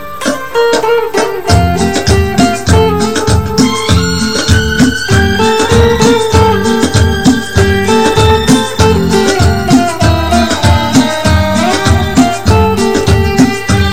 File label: Instrumental